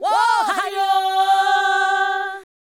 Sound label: Fem Vocal 128bpm G FX, African Voices